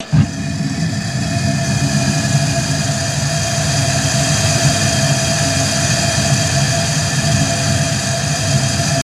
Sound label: Acetylene Torch; torches